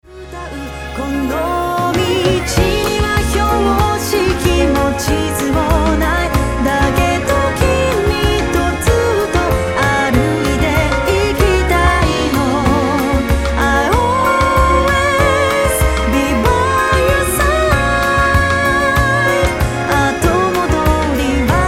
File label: Strings